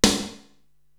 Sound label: SD Cracker Room, Korg TR-Rack drum machine